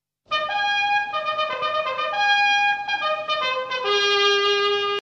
Trumpet

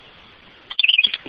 Bird Tweet LOUD

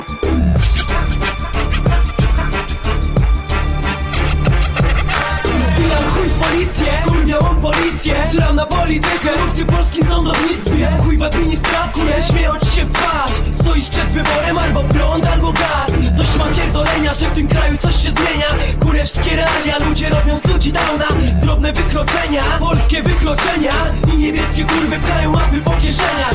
Hip Hop

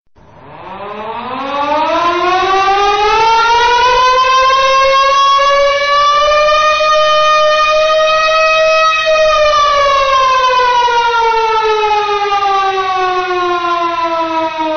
Siren

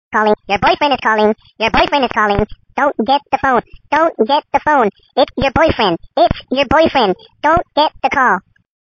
Calling

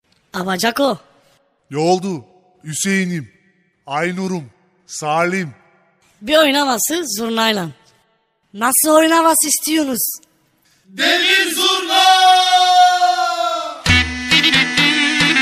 Deli Zurna

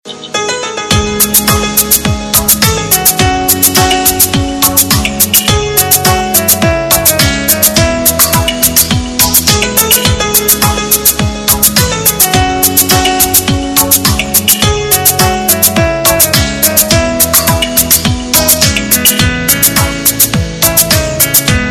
Instru Mix; Veer Zara

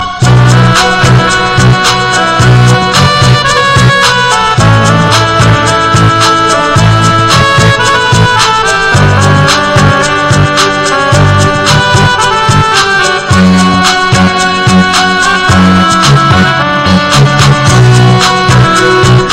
Piano Mix

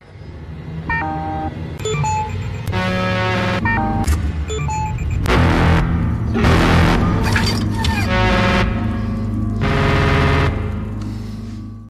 Drone

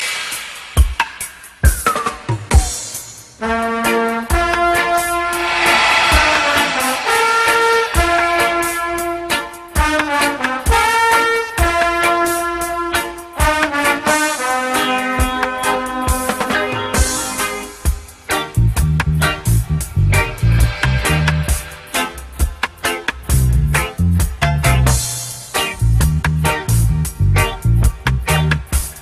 Ska

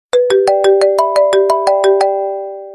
Single Click

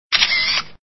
Photo Clic Tone

